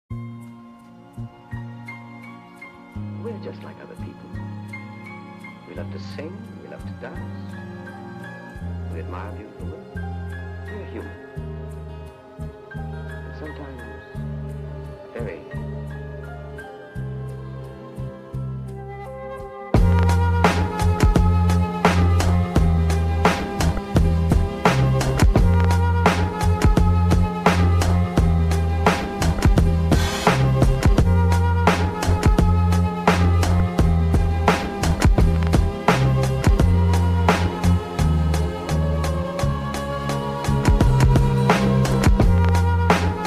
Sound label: Walk in the Rain Oldschool Jazz HipHop Beat